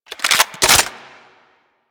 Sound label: Reload, Weapons; Warframe; Gorgon